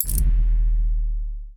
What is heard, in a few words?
inventory item close; Counter Strike Model Pack; CS:GO; panorama